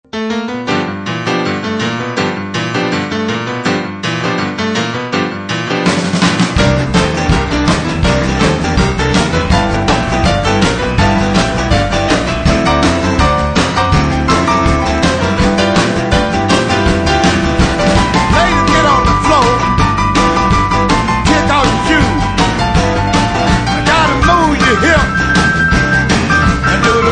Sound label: boogie woogie